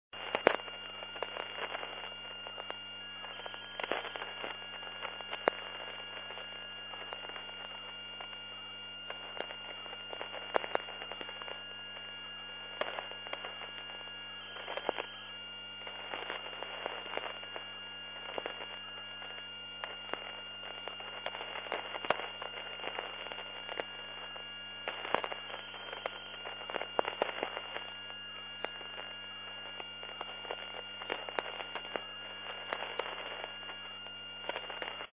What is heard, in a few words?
136 KHZ